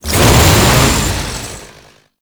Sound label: Saw Melee Swing; weapons; Warframe; Grn Ghoul Saw Player; Kuva Ghoul Saw